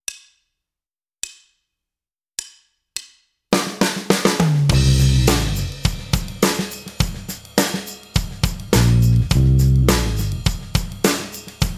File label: Rhythm Guitar